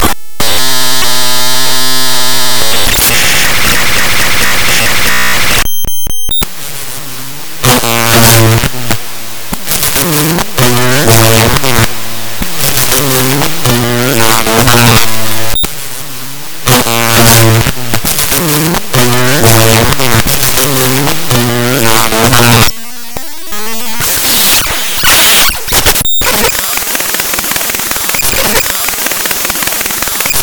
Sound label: ehhhh